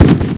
bomb smoke; Indiara And The Skull Gold

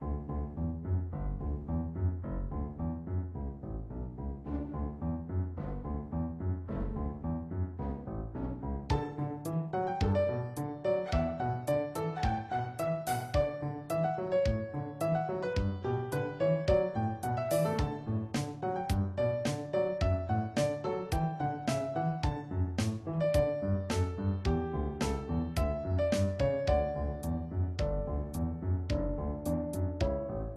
game music; Green Chick Jump

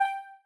button; Alpha-E-Corp

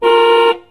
new car; DeadlyRace

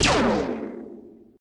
gun; EarthAttack

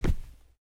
walk; CityHero

